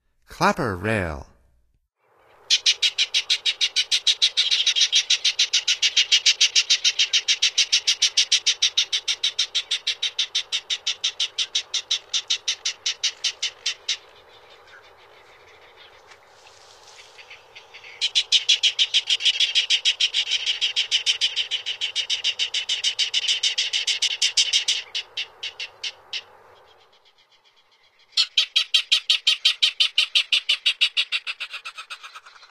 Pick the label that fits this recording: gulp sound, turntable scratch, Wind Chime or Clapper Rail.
Clapper Rail